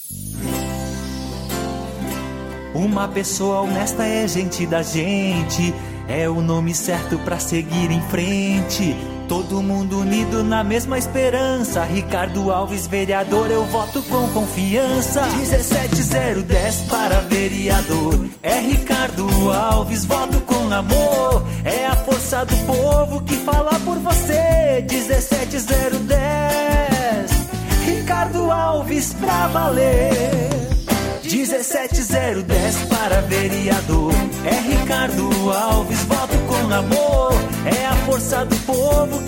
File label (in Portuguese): Jingle Vereador, jingles eleitorais; A forca do povo 2020